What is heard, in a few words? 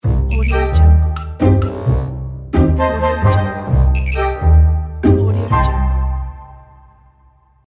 funny logo; jaguarmusicstudio